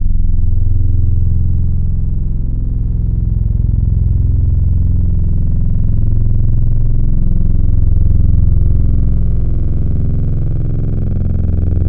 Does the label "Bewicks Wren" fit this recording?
No